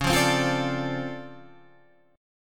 D-Minor 7th C sharp, chord

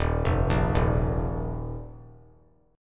D sharp Suspended 2nd; arpeggiated; JGuitar

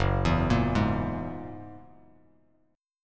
F sharp 9th F sharp Bass Standard; F#9 arpeggiated

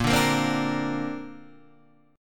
Bb Major Add 9th, Bb, down, Guitar, DADGAD

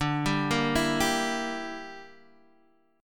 A sharp 6th Flat 5th

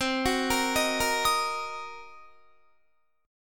C Minor 7th Flat 5th Guitar, chord; Cm7b5; arpeggiated

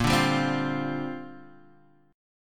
A sharp 6th Add 9th, Guitar DADGAD

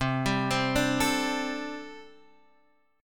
D 7th Suspended 4th, C

